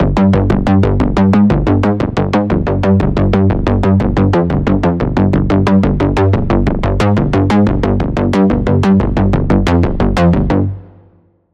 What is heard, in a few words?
Pulse; Pulse - Tension Switch; Routed Sound